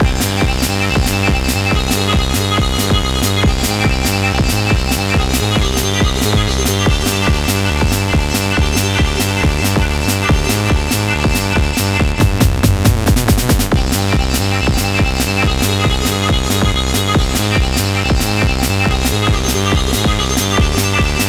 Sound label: bosstune; Fighting Space